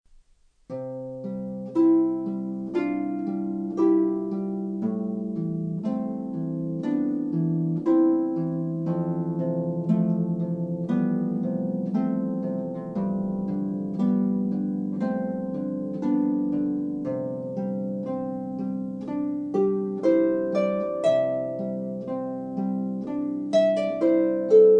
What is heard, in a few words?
Water Is Wide, harp